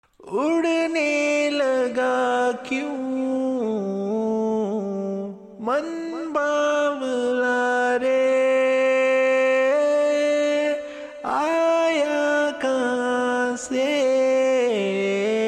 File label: Acapellas, soul 85 G Maj